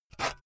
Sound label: saw use short